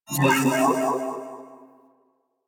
Iron Shield Strip Attach Enemy; Warframe; Warframes

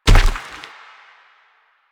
Proto Infested Runner Step Default; Warframe

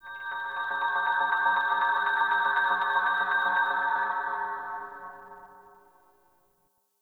Clan Dojo Research, Ambience; Warframe; Dojo Randoms

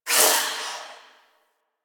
Overwhelm; Warframe; Wisp

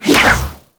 Ball Throw Whoosh; Warframe; Missions; Tenno Ball

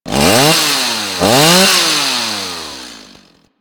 Ghoul Sawman Rev Ambient Attack; Warframe; Grineer Ghouls

